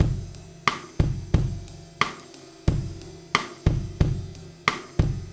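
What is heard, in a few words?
90 bpm, beat loops; Zero-G; Monster Beats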